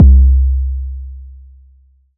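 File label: bass drum LUSH; ModelCycles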